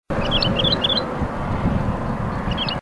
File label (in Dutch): Boomleeuwerik, trekroepjes